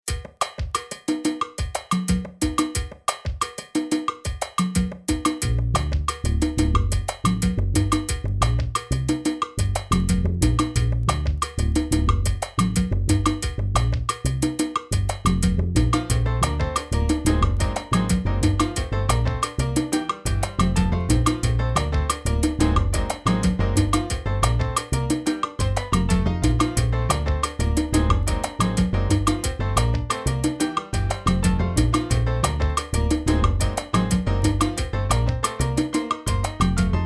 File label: bass